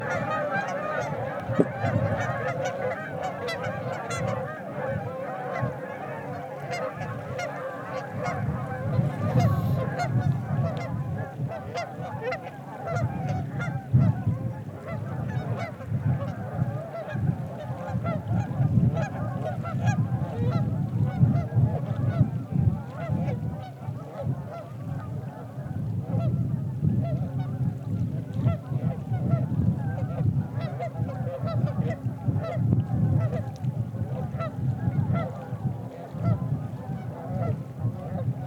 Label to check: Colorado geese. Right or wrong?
Right